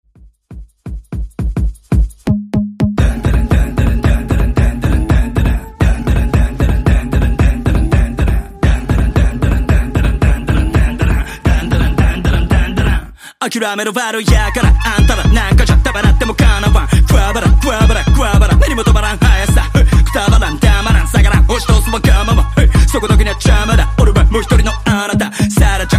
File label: 170 BPM